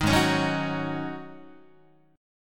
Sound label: Ab 7th Suspended 4th Ab down Guitar